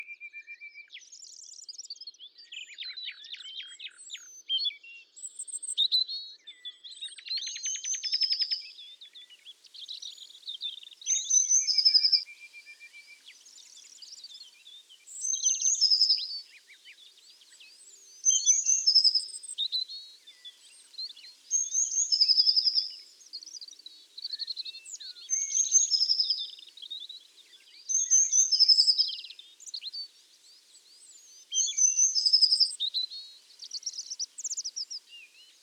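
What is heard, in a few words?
blue tit; lazorevka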